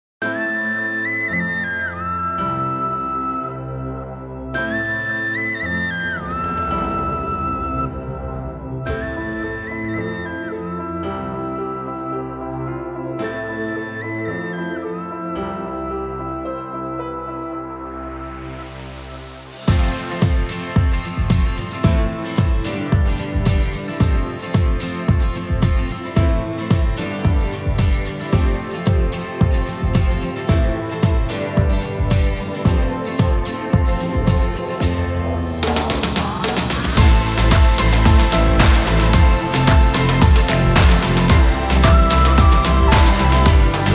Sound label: karaoke version